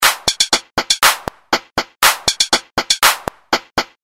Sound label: loop rithm stick claps; Sound Graph